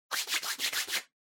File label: Hand Foley, Rub